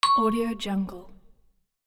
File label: vibraphone notification ding